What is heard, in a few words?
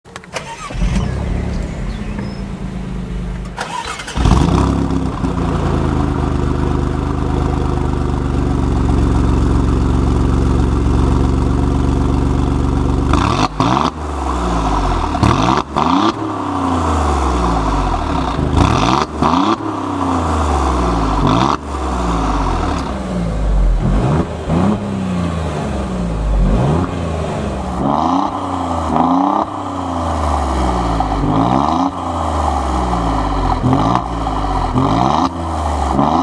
Giulia Quadrifoglio 510hp Exhaust SOUND; 2017